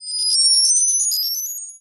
Science Fiction Sci-Fi Electronic High Pitched Ring